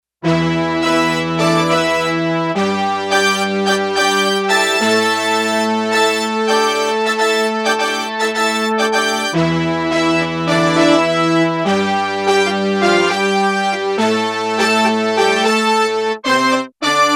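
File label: Brass Tutti octave; Digital Synthesizer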